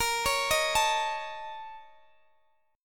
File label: A sharp Minor Major 7th Double Flat 5th A sharp Guitar Open D